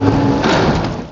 Hatch

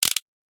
cowboy reload

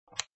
release button; papa s freezeria gamerip

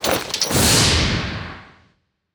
attack

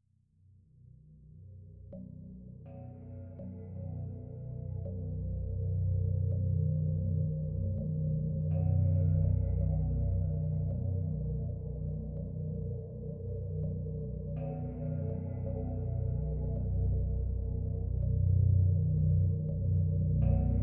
Creepy Night; Dream Daddy: A Dad Dating Simulator

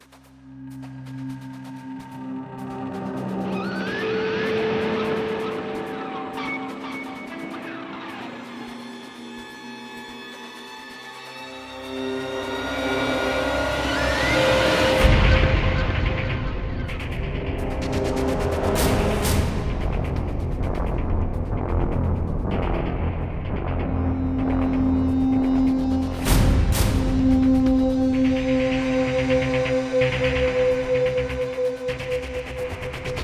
Battle Set Ambient

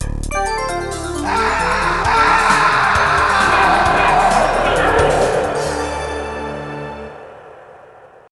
Death Scene; Area 51; arcade

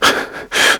Fatigue Medium

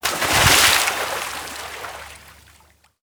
environment water splash

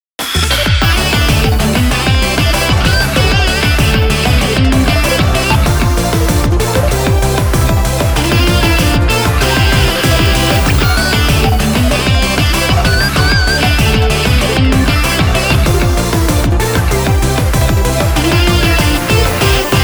JINGLE - Speed Shoes; sonic before the sequel

